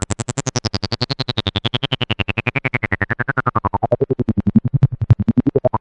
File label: arpeg, Synths; fatman